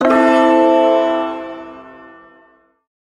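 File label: Shawzin Pentatonic Major Chord; Warframe; Duviri Ersatz Shawzin; Chords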